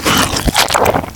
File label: suck, headcrab poison; hl2